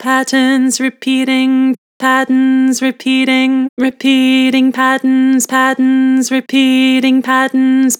Vibrato, Vocals; Patterns repeating; Harmor Vocals

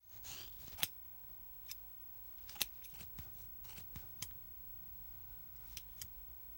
Hair Cutting Thick Hair; Fairlight Sound Lib

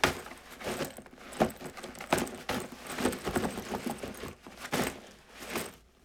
Backpack Movement; Fairlight Sound Lib

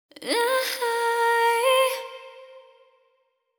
Euphoria Ad Lib Wet - 140 BPM G Min; Cymatics; Vocal Sample Pack; Ad Libs

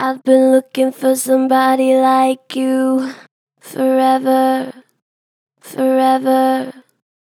Pitch Flat Gentle, Vocals; Ive been looking for somebody; Harmor Vocals

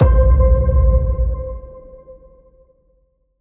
Stress

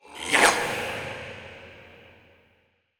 Processed Battle Shouts, Viking Vocal

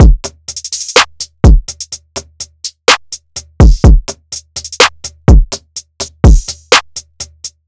Drum Loop - 125 BPM, trap; Drum Loops; Screw Loose Drum Loop; Cymatics; Trap Starter Pack

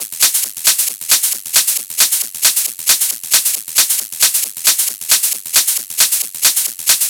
Hat Loop 135, Techno

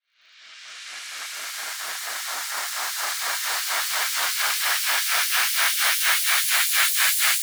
White Noise Up; Cymatics; House Starter Pack

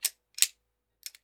Loading Bullet Into Gun; Fairlight Sound Lib

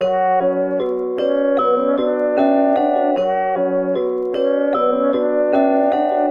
152 BPM B Min, Trap Melodies; Smoke In My Lungs; Cymatics; Trap Starter Pack

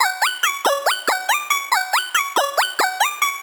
Riddim Melodic Loop F# 140 Sting; Ghosthack; Riddim Essentials Volume 2

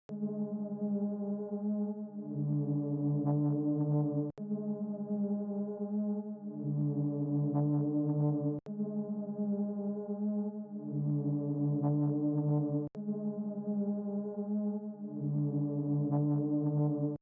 112 BPM C# Min Brass, melody loop stem; Trap; Loop Stems; Cymatics; 2022 Melody Collection - Trap Pt 2; Lucky Number

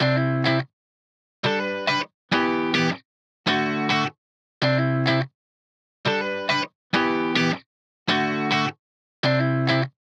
104 BPM E Min Main Guitar; Cymatics; Miami Guitar Pack - Pt 1; Autumn

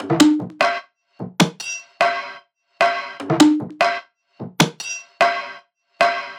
Drum Loop 150 BPM Percussion; Experimental Drum Loops; Loop Stems; Cymatics; Mystery Pack Vol 4; Arrival Drum Loop

